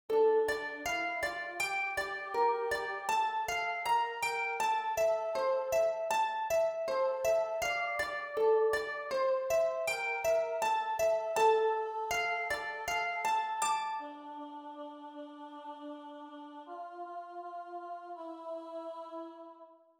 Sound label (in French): Psaume HARPE